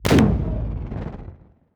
titan muzzle energy explosion, weapons; kaiju; Stellaris; biogenesis

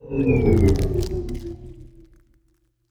ship construction move; ships; biogenesis

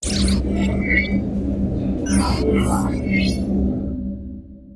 ship bridge; Stellaris

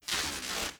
reality breach spark; Stellaris; nemesis

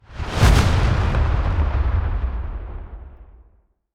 kaiju titan muzzle fuel explosion; Stellaris; biogenesis; weapons

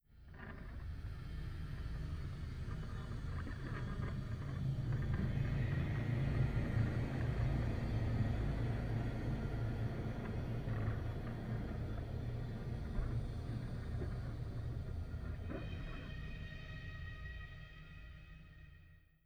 falling star; Stellaris

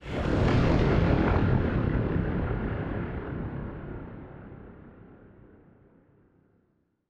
ship cruiser move; Stellaris; machineage

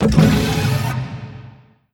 spaceport building complete; Stellaris

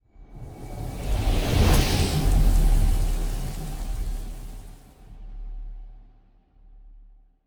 ship colony ship move; Stellaris; machineage

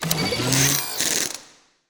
ship designer add utility; Stellaris

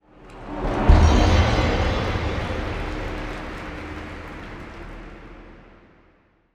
event vote; Stellaris; federations

